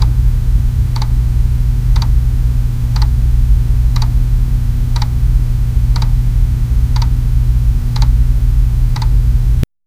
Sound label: ticking clock in a quiet room building tension for a suspenseful scene